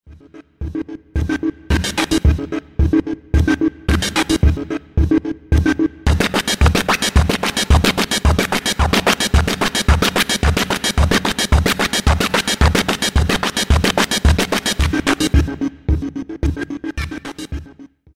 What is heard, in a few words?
beats